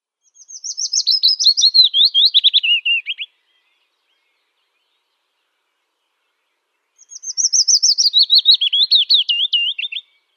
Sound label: Willow Warbler song